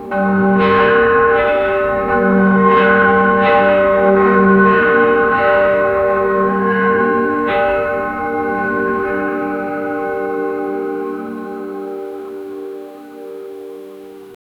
distorted and flanged, ceremonial

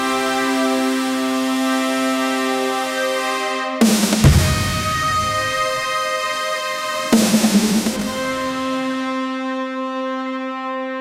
fast paced military loop, brass stabs

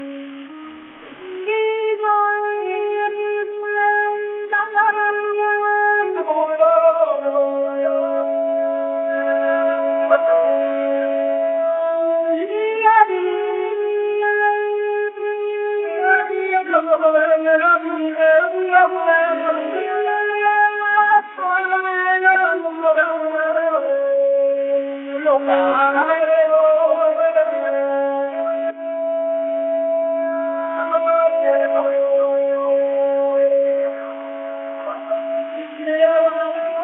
music radio 1940s chile

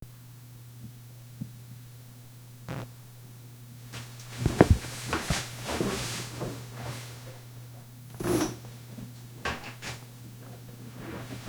sound of someone shifting, lifestyle

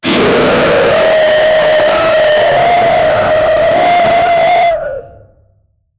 a low and deep scream, human scream

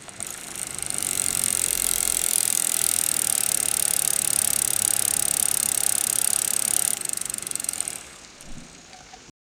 repairing bike engine

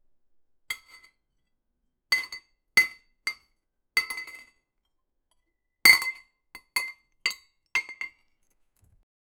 spoon in tea cup when, tea

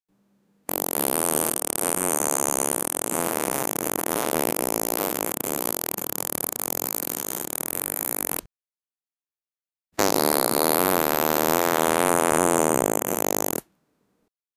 farting farting; prank

